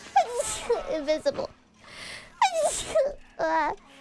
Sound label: Sneeze